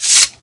Slash; Life of a Naomi

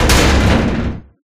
Open; Life of a Naomi

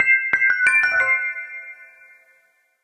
Refresh; Life of a Naomi

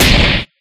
Damage; Life of a Naomi

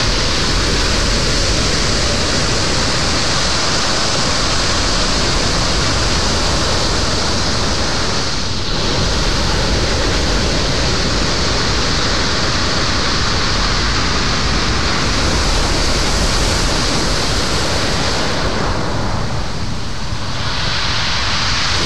Wave; Life of a Naomi; bgs

